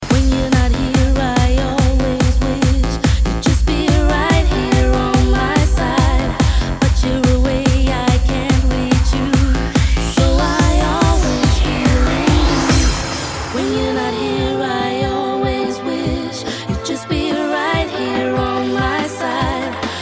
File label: trance; quake